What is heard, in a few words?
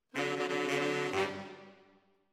ORTF SCHOEPS, piano